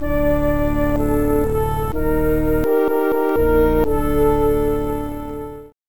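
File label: battle lose; Castles II Siege Conquest; CD32; Amiga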